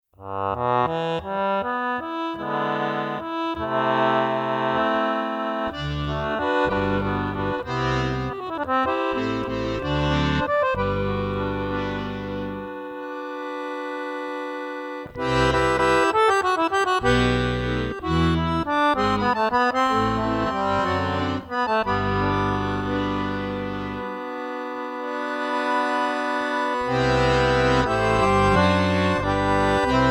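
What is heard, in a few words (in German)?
alle register, harmonika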